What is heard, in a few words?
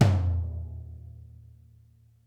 Interactive Drum Kits R; Sonic Reality; 90s sample cds